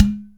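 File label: open tone, UDU; AKAI S-Series CD-ROM Sound Library VOL-5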